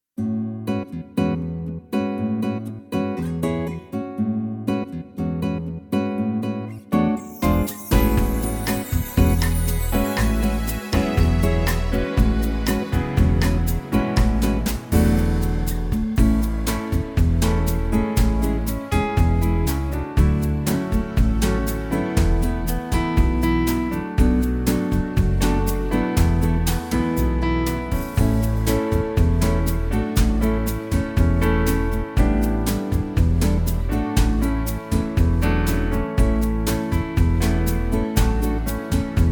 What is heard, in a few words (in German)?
vollinstrumentiertes Playback Style BossaN; A-Dur